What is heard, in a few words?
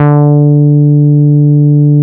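NORD F D4, bass; NORDY BS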